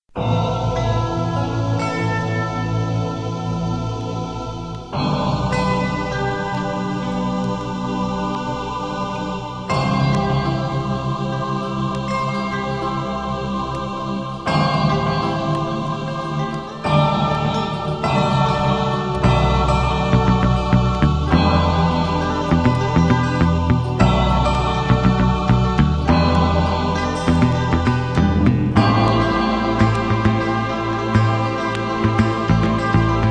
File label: sax movement, classic